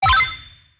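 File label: System Bonus Balloon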